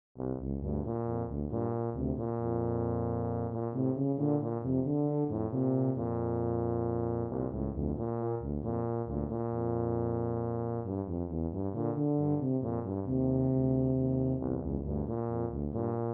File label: tuba duet